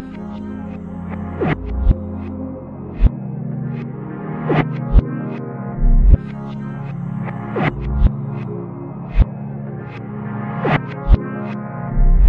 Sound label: LOOP